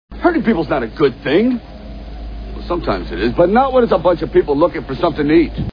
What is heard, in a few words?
hurting; demolition man